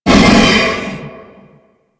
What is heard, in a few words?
Infested Bone Blade Vocal Idle; Warframe